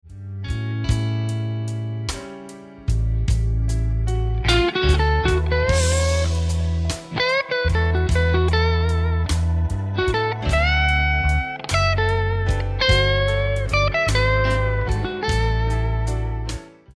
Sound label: Lick, guitar